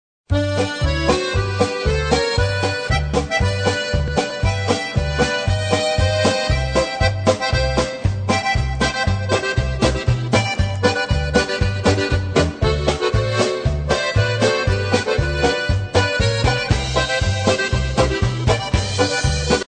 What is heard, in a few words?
ZING ZANG POLKA